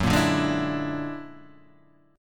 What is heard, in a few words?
Gb Augmented 9th Gb down; Gb+9 strummed; Standard